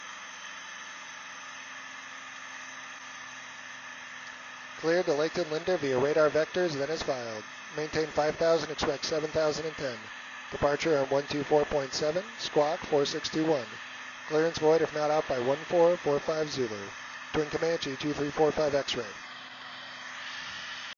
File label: Piper Twin Bose A30; Zulu 4; sound comparison